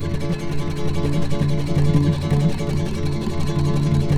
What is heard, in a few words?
D# M, Guitars; Spectrasonics; Hans Zimmer Guitars VOL-2; FLAM TREM CH